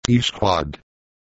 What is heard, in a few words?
eeeee